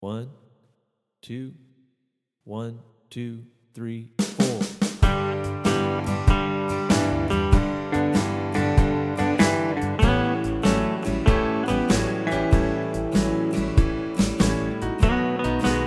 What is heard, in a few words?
Slow Speed Play Along, guitar